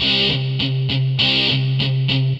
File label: GUITAR, ROCK POP; MAGIX